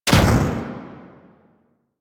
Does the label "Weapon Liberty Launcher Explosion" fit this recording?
Yes